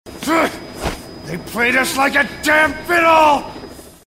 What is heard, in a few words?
fiddle